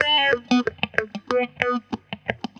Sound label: GTR, Roots Funk; USB Soundscan vol.41; AKAI